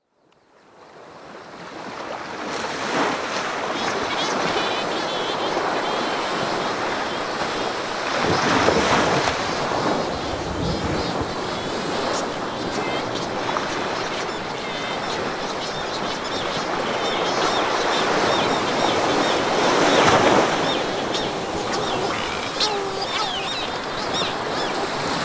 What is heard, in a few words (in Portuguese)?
Som Ilheu Vila Franca; zonas costeiras; ilheu vila franca campo